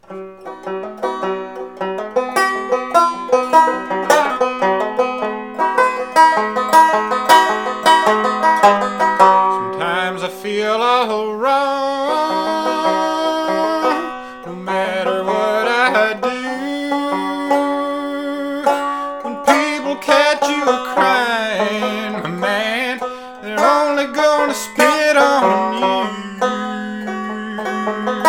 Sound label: blues; dishonest internal monologue; weeklybeats-2018